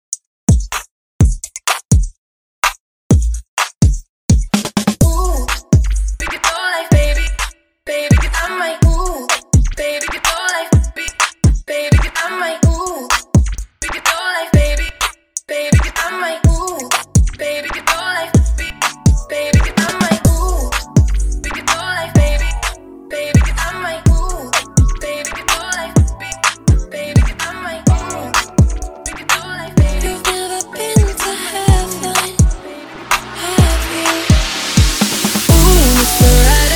Hype scratch Extended

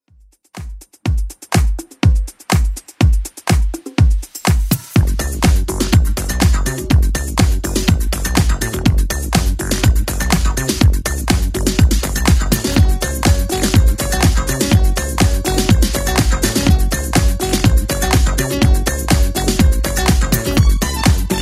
80s Club 123bpm

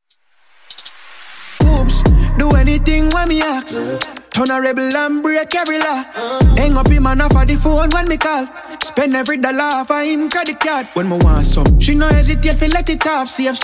Intro Outro 100bpm

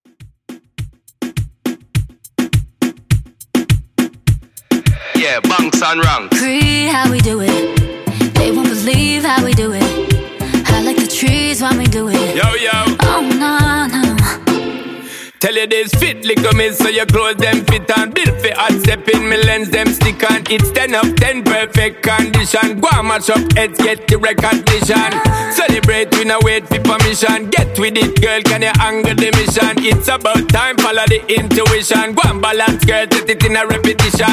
RB Reggaeton 103 Bpm

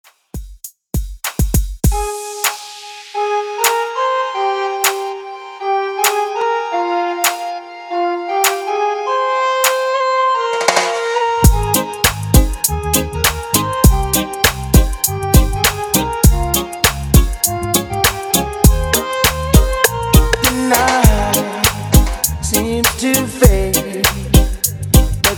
90s Reggae 100; Redrum

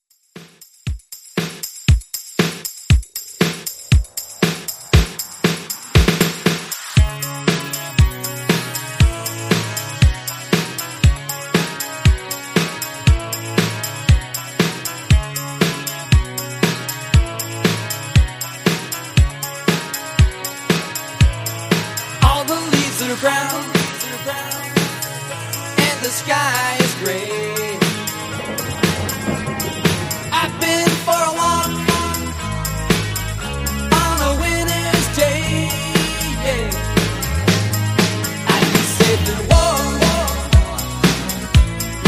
80s Redrum 118 Bpm

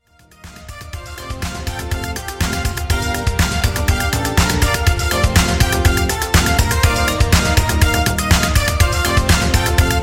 80s Classic Redrum 122Bpm; djyan

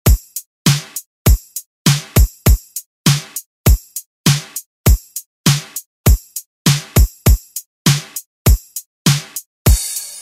Party Hype Redrum; Deejay Hit

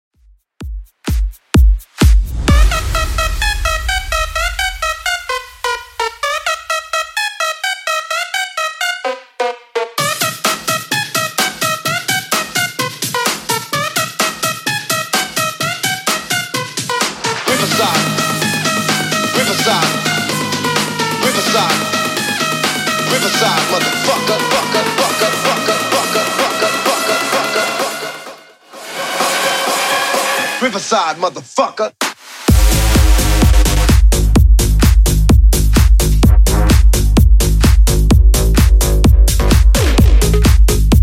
House DJ Friendly Edit 130bpm

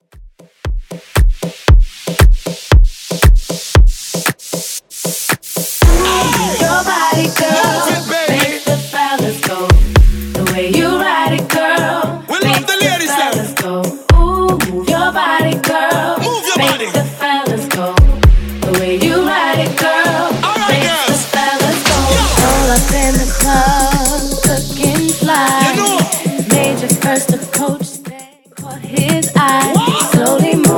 Afrobeat 116bpm

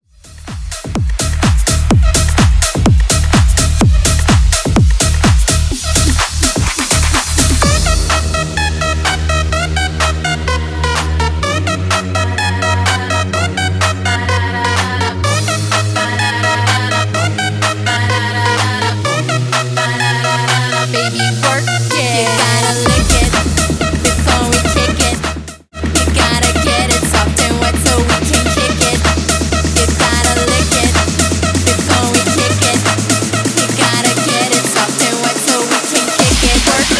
Beatshow Edition Clean 126bpm

